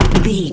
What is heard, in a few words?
footstep metal land